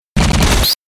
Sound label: Big Nailer, Breakers & Transitioners; Best Of N2O Library